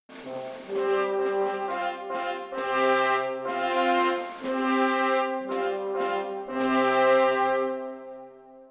card guard solider music

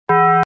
bong; taco bell

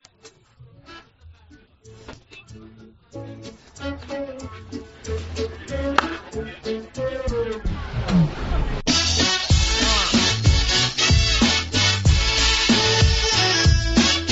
hip hop

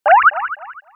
boo powerup; super mario galaxy

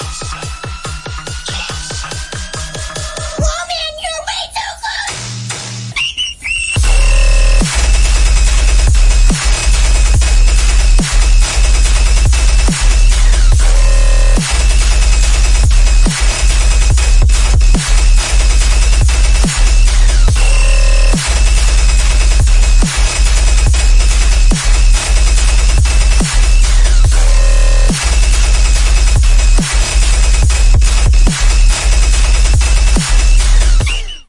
dubstep

